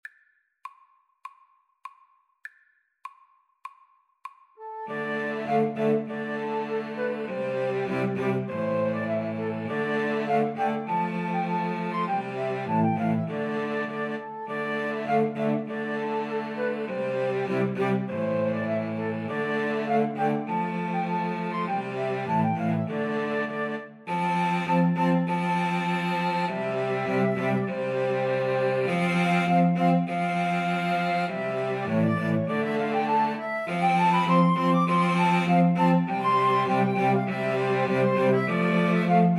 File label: flute violin cello